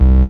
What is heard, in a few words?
DM BAS, Dance Mega Synth